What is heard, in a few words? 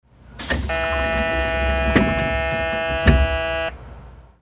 door close